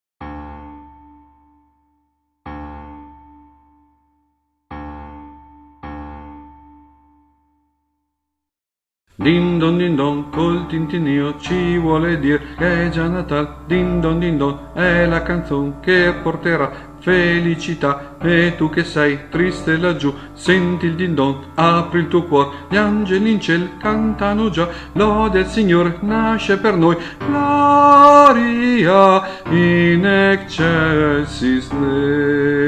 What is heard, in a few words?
carols sop; CAROLS OF THE BELLS